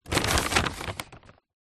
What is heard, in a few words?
foley paper heavily painted movement